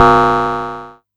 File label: Amdek Perc; techno drum fx; Analogue DrumHits